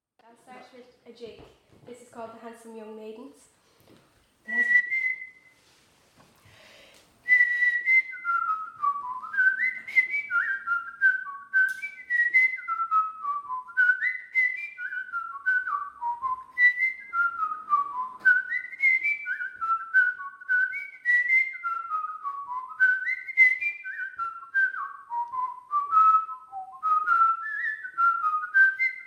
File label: Whistles a Jig